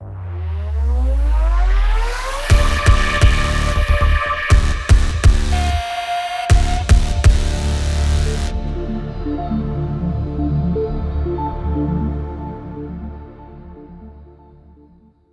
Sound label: Win